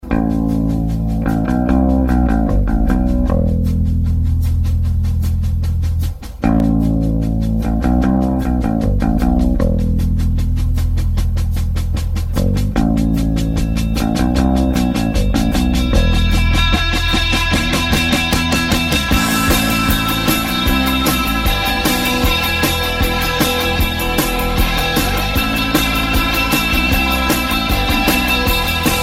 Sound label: theme; formula 1 uk